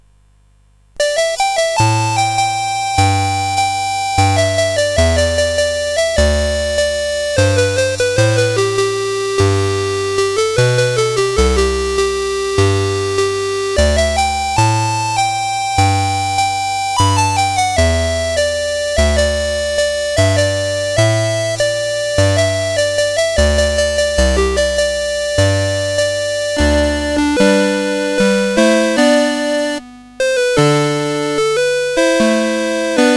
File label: CSG8580R5